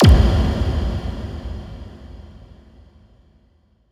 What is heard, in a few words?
Impact Underground; One-Shots; SFX; Ghosthack; Color Bass Essentials